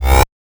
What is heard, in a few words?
Synth Sounds; neuro; Stanza